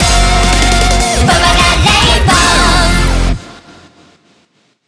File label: BGM Reach